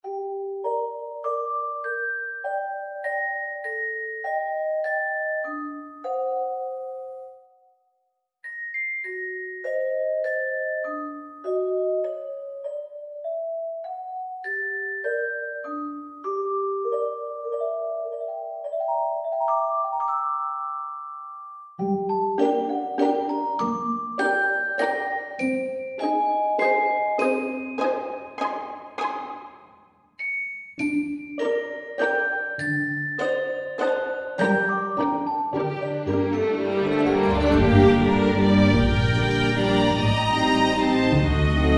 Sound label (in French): valse